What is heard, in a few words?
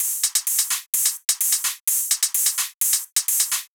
drum loop 128 Top; Electro; drum loops; Anthemic